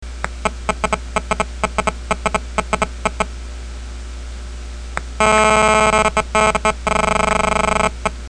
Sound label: cell noise